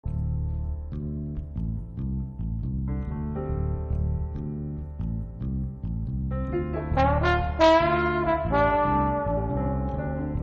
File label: blues